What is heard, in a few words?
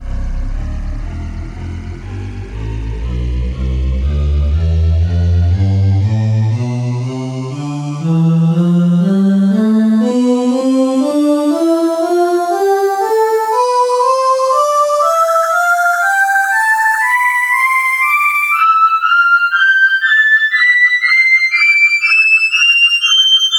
synth voice; tonleiter